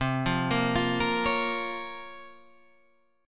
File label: Bb Minor C, Bbm/C; arpeggiated; JGuitar